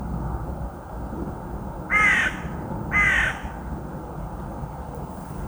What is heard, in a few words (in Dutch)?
kraai Zwarte kraai Corvus corone; dieren